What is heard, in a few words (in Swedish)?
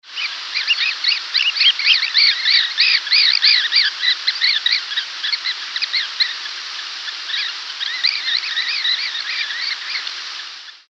Brednabbad simsnappa